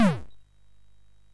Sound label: Shuffle, MOS6581R2